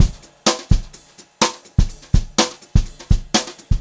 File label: Brushed Drum Loops; USB Soundscan vol.39; AKAI